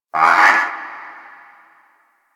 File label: Forest Creature Mid Far; Ambience; Warframe; Grineer Forest